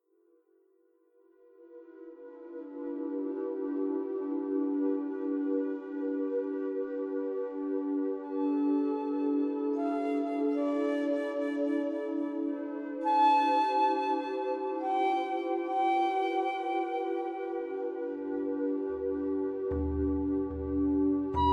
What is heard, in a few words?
birds